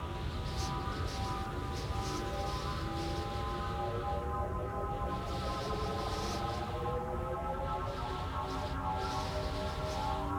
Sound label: ambience time travel ambience; teardown; ps5 windows xbox series xs